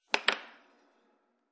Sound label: Air Hockey Puck Hits